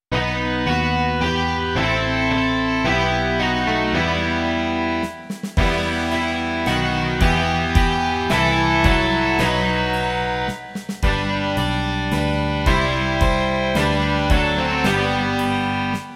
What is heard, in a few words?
rock